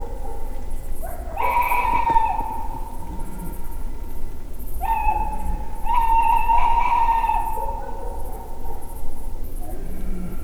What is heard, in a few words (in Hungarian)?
macskabagoly; termeszetben